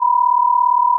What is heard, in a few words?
1000 Hz plus 962.5 Hz 37.5 beats per second; (24% of Critical Bandwidth)